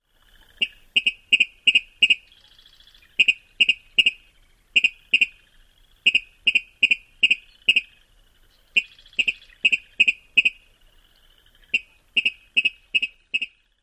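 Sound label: Virginia rail kiddick call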